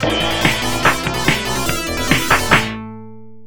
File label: Round Clear; Shadow Warriors; Ninja Gaiden; Ninja Ryukenden; arcade